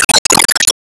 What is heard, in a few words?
Enemy Jet Active Shot